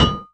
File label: repair; diablo 2